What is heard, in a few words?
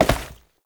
Step Default; Warframe; Oraxia; Foley